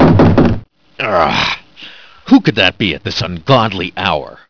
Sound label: knock door